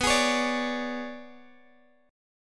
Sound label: B Minor 6th Add 9th B, Guitar Open G